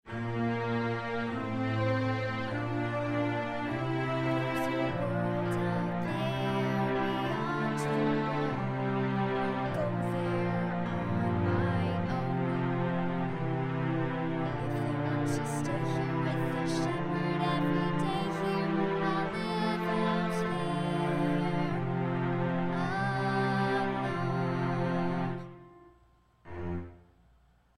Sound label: Vocal; Wall Reprise